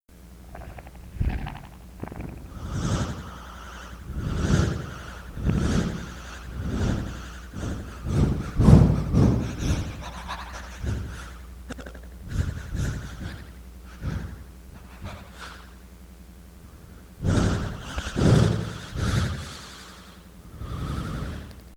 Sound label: breathe